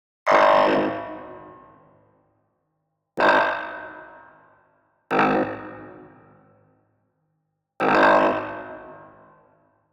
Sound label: Digital Click Negative